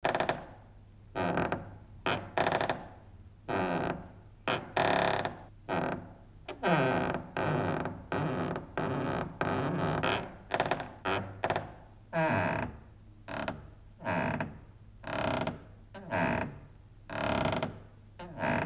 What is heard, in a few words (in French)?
variations porte